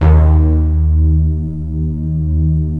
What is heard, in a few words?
NEON D#5; M1+D50